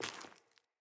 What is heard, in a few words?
Sandals Walk Rock; Player; Footsteps